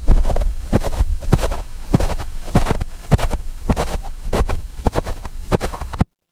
footsteps snow

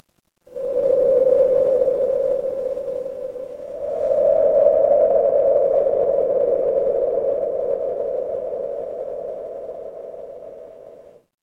ambience forest

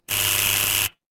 door doorbell

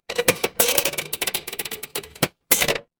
mechanical slot machine

